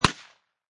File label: Machine Gun Uzi Model A Single Shot; Machine gun: UZI Model A. Single Shot, version 3.